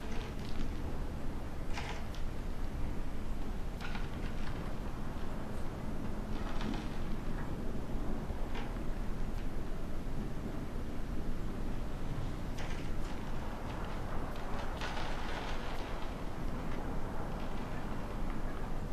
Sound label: Amb Cabin; Silent Hill Homecoming 2008 gamerip pc ps3 x360